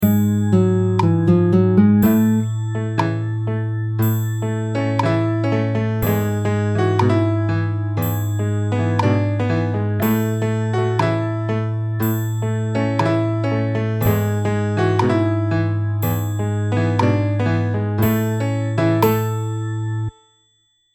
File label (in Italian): tavolino base